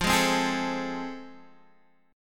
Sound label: A Minor Major 7th Sharp 5th, F